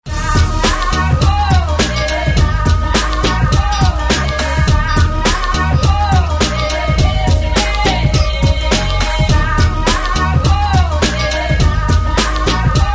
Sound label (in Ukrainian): skrypka vechornyci